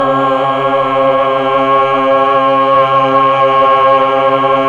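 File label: VOX Tron Choir C#4, Mellotron Vox, Slow Choir; Keyboards of The 60's and 70's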